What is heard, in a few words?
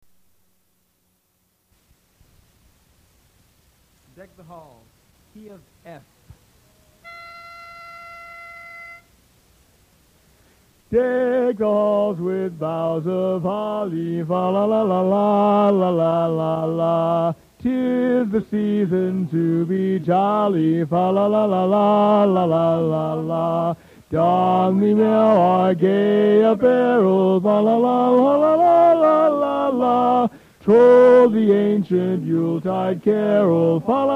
Lead, Chorus; Deck the Halls